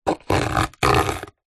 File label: wood thin scratch with sharp metal fork; foley; zapsplat